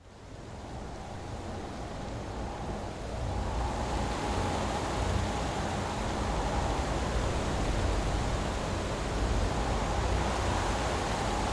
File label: wind